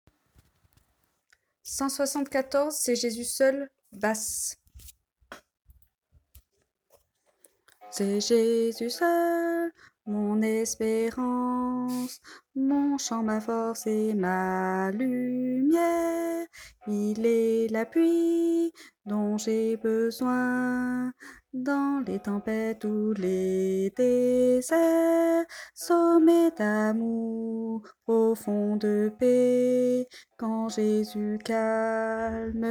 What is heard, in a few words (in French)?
basse